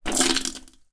human spit water into toilet

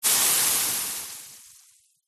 horror acid burn; zapsplat

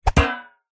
impacts swoosh into metal thud hit

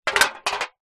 drink can empty down on concrete ground

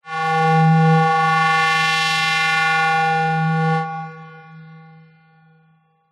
sound design fast droning tone slight reverb

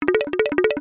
cartoon run classic wooden bongo style rhythmic; zapsplat

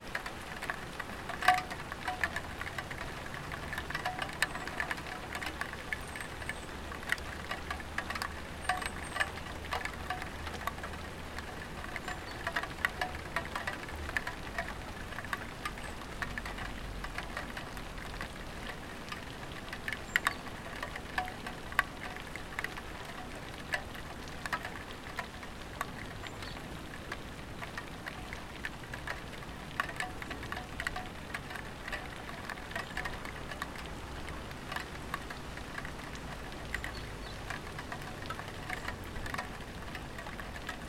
rain light roof

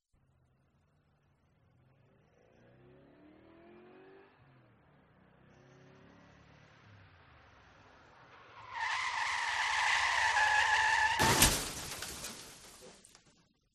Auto Crash; audio hero

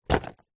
chocolate box empty set down; zapsplat

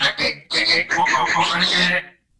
Robot Talk; little robot sound factory

